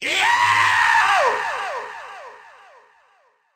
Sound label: Scream Italian Ringtone; Pizza Tower